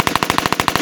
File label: Uzi